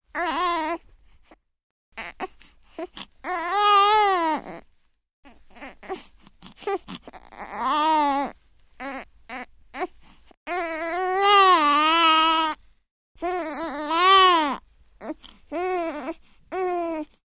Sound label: Newborn Baby Whimper, cries & moans